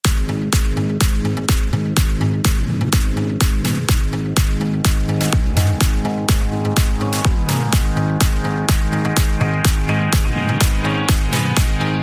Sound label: Mashup